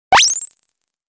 Nice Cut Ringtone

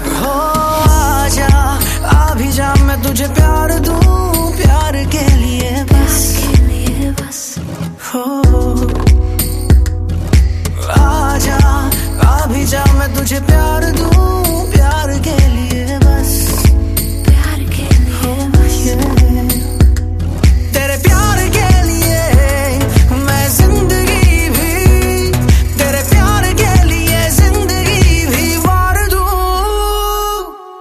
Romantic Hindi Ringtone

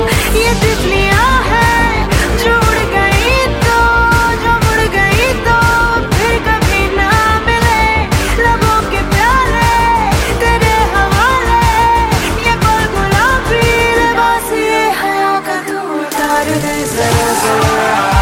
Hindi Ringtone

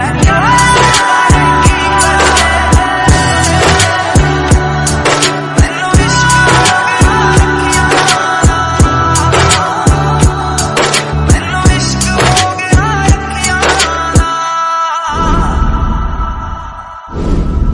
BGM Ringtone

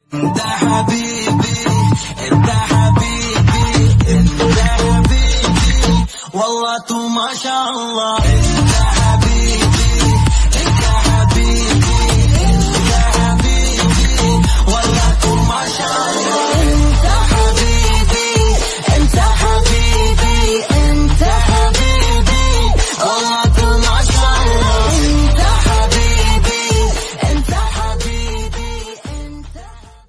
Arabic Ringtone

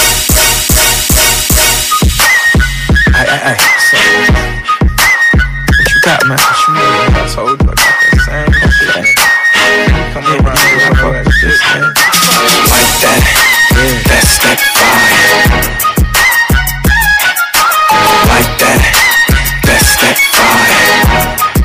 Ringtone for Samsung galaxy S7

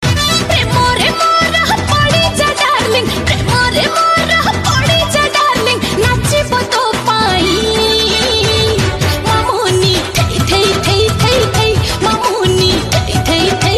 Dj Odia Song Ringtone

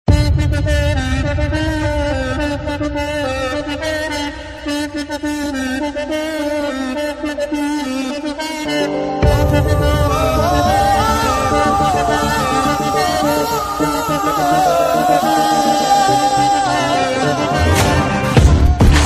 Theme; Janatha Garage

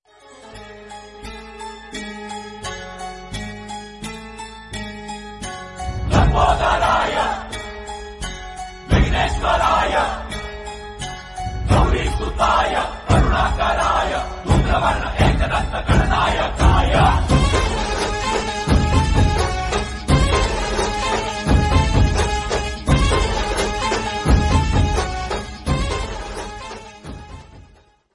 Ganpati Bappa Morya Bhakti Song Ringtone

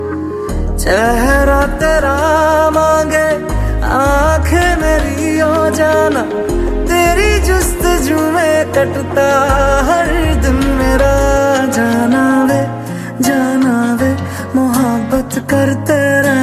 New Hindi Song Ringtone

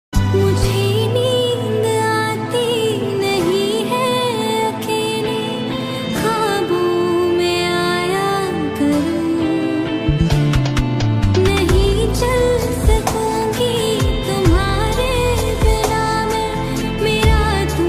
Ringtone Female

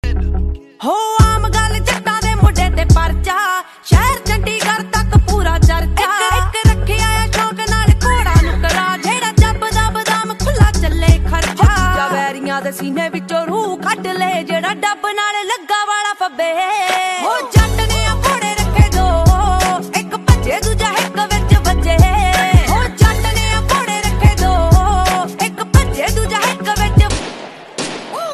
Punjabi Song Pistol Ringtone; ringtones

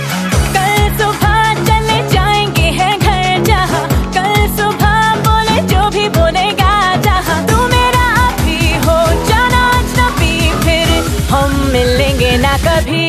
Bollywood Ringtone

